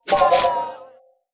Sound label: purchase fail screen; golden wheel; City Verse Tycoon